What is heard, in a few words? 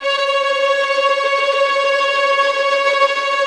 VI TREM F; AKAI Advance Orchestra Upgrade 97 Vol.1